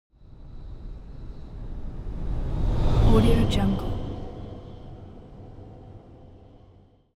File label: sci fi vehicle train passing long